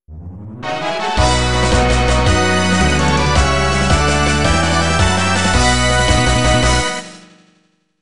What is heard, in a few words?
Fanfale; la mulana remake original soundtrack